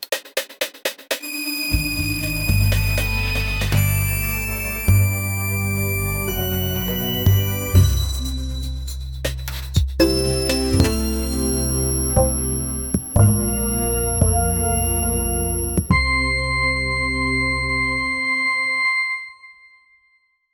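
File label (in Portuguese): faca uma vinheta de abertura, youtube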